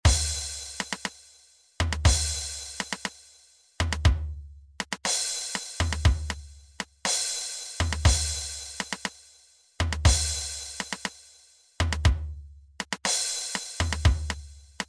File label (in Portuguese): Toque